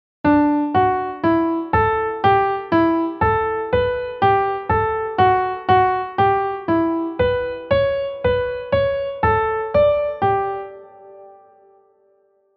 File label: Random D major improv